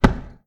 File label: footstep; SlimeoosOdyssey; godot